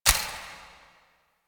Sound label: Countdown Tick; UI; Undercroft; Warframe; Duviri